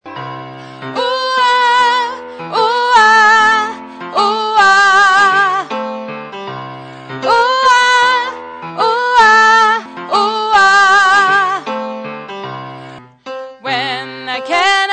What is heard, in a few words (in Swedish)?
bas